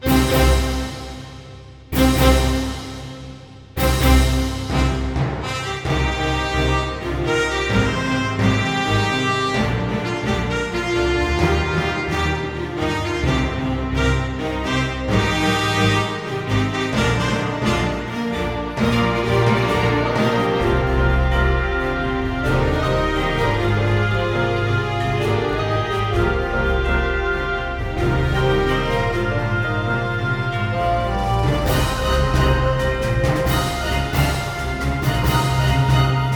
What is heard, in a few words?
pursuit (orchestra)